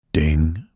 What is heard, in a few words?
ding